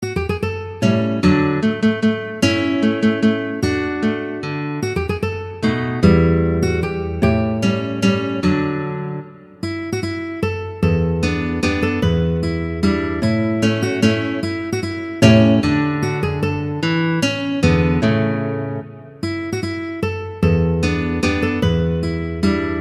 Waltz; Aguado; Waltzes

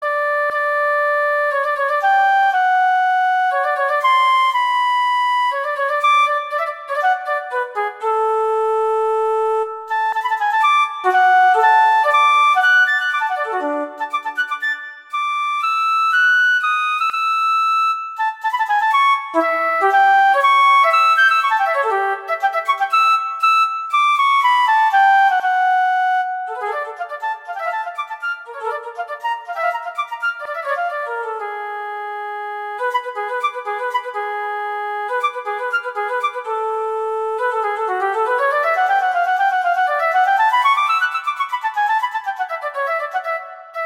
Allegro aperto - Extra Cadenza; Mozart; Concerto K314

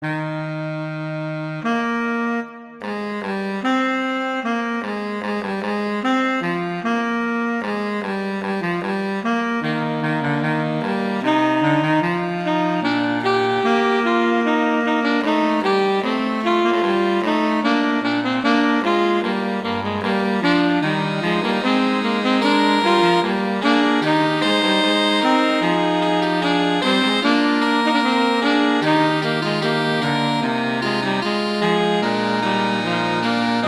Fugue; Bach; Prelude Fugue VII

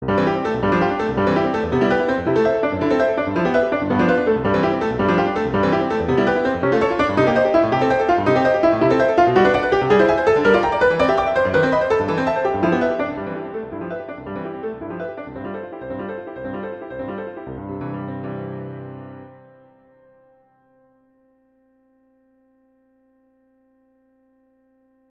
Agitato, Chopin; Preludes; in C major

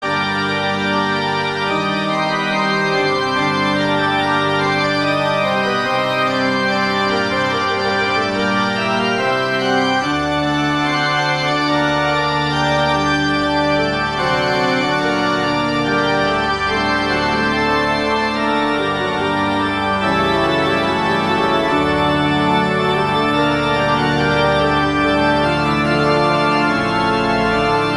Fantasia in G Minor; Pachelbel; Fantasias

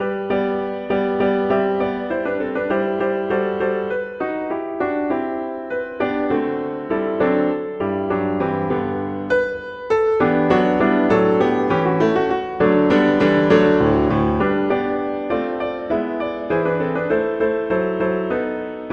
Recessional March; Grieg; Norwegian

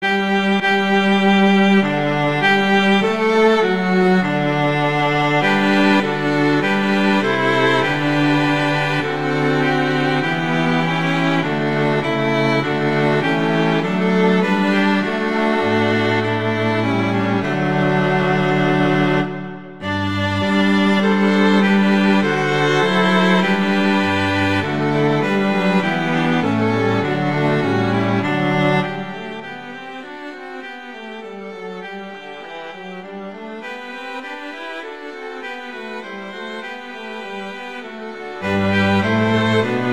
Strings; Christmas Variations Strings; O Come All Ye Faithful - Adeste Fideles

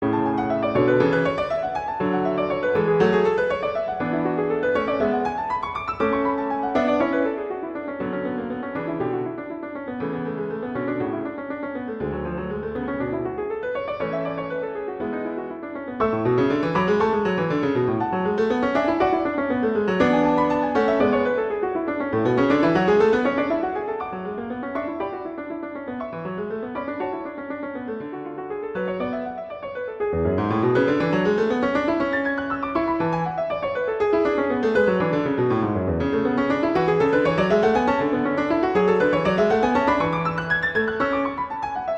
Exercise - A major; Clementi; Preludes

